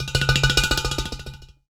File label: UDU, African; Supreme Beats; Spectrasonics; SB Udus